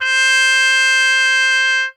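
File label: trumpet C hi mute harmon stem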